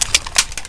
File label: elite reload start, weapons; cstrike; DEX